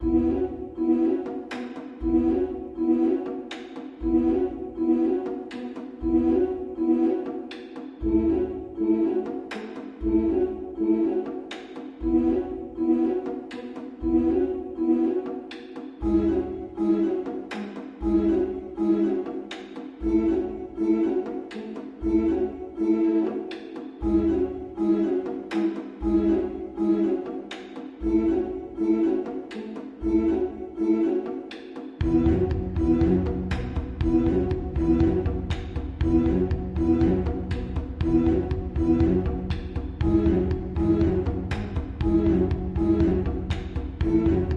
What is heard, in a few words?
Rhythm and Melody Elements 120 bpm